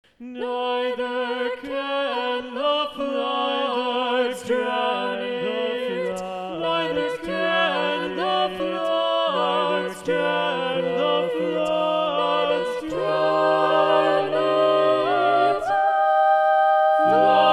SATB Alto 2 Muted

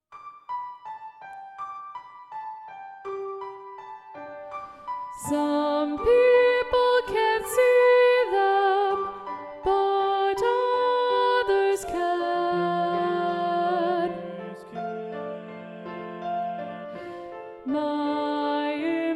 SATB Soprano 2 Predominant

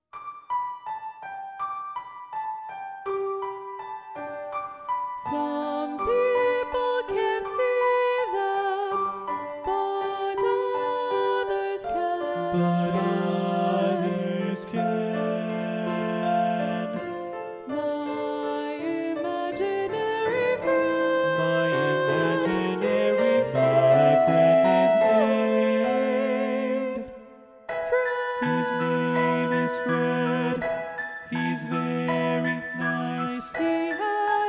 SATB Alto 1 Muted

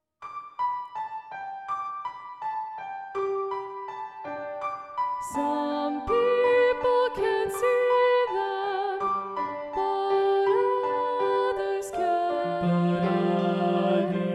SATB Soprano 2 Muted

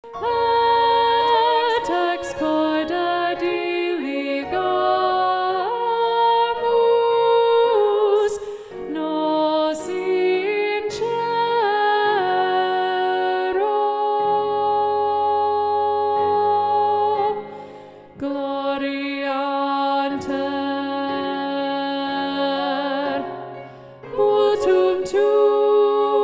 Ubi Caritas SSAA Alto 1a Predominant

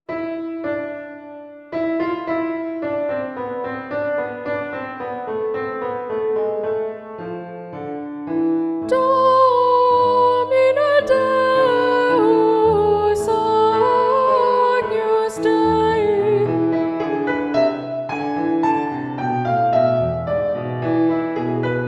Domine Deus SA, Soprano Muted; Mass in G; J.S. Bach; honor choirs

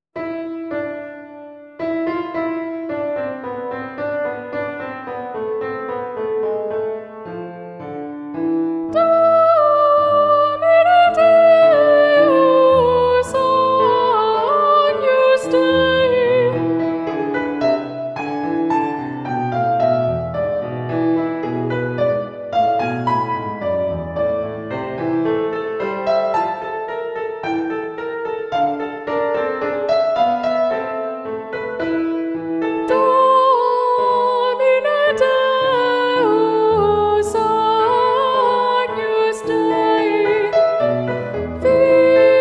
Domine Deus SA, Alto Muted; Mass in G; J.S. Bach; honor choirs

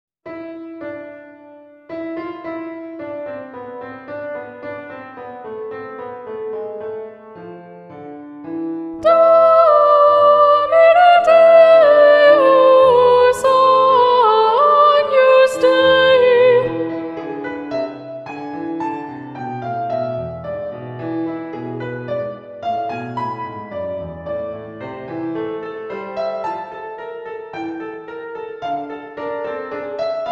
Domine Deus SA, Soprano Predominant; Mass in G; J.S. Bach; honor choirs

